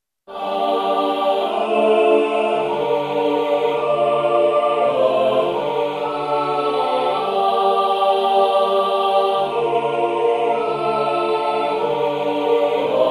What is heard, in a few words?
SATB; Podekujme Kristu Panu